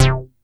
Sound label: MC 202, SEQ-FX; Masterbits; Vintage Voltage